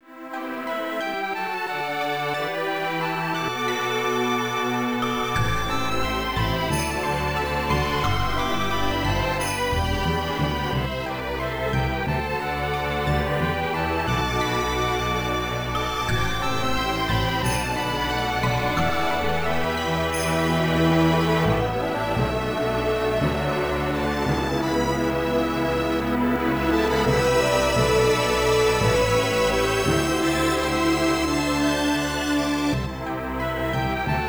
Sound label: Machine Demo BGM